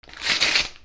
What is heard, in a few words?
PAPER